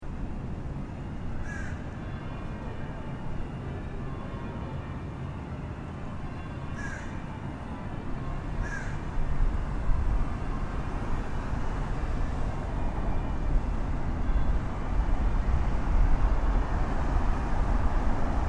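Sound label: Bells Fountain women laughing. Walking west on Georgia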